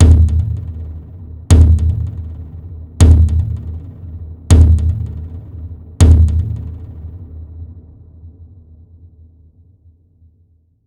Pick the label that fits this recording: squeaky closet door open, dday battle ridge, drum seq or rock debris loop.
drum seq